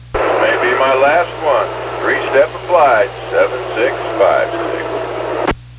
3step, trains; Railroad; "Three step applied."; YSCO-39 switching cars.; Conrail

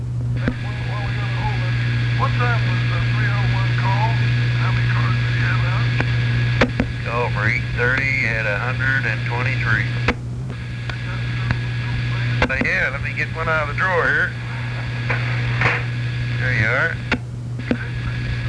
hi tower, trains; Railroad; CSX Parsons Yard yardmaster; talking to the airman; The CSX Parsons Operator used to reside in the "Blue Building" at Parsons Yard in Columbus.

